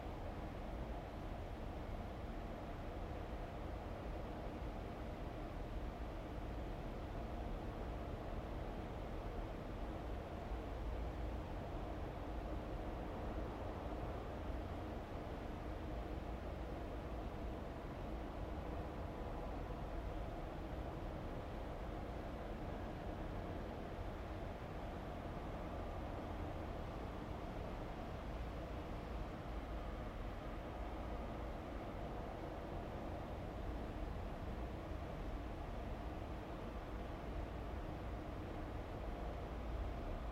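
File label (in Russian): глухой гармоничный далёкий звук работы производства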